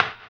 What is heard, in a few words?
HAMBONE, Vocal Blues Soul; Spectrasonics; Planet